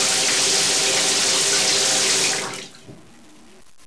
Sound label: shower turn off